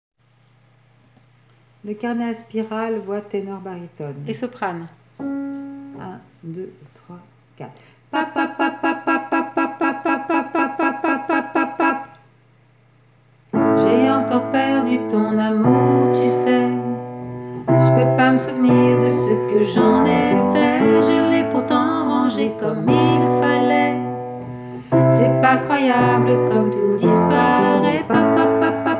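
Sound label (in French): voix tenor barytone et soprano; Voix Ténor Barytone soprano et alti première partie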